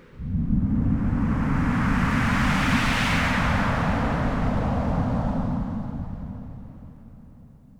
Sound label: Swoosh